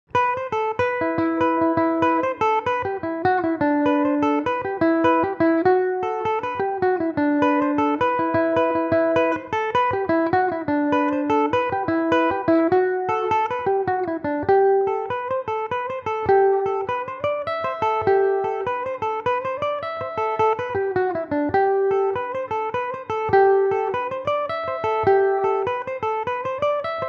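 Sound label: E minor, Irish mandolin; humours of whiskey; belmuse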